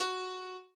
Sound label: piano